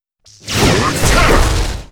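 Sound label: ghost attack, monster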